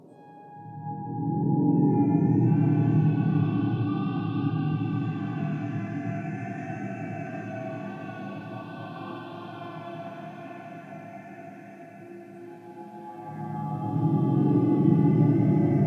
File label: Start Ambience; soma gamerip 2015